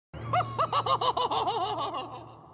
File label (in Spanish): La risa de IT